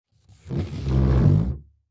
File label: Foley Footstep Golf Cleats Scrape On Hollow Wood Surface Slow; Foley Footsteps